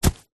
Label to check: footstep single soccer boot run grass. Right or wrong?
Right